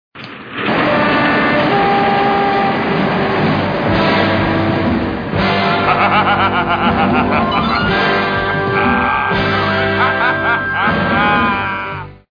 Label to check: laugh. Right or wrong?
Right